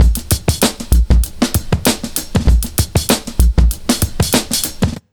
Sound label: 97 Beat, Underground Hip Hop; USB Soundscan vol.02; AKAI